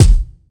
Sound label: clicky kick d key, kick drum sound tuned to the D note. Loudest frequency: 594Hz